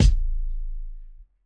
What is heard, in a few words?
kick; nova sound